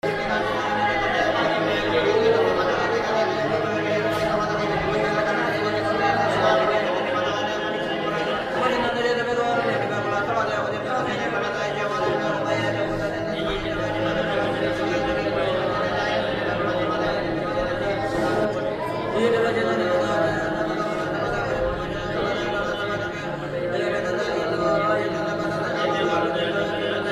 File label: religious indian monks